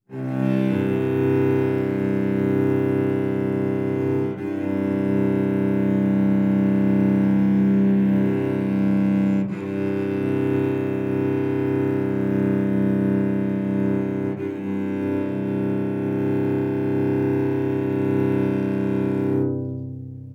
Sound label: cello cg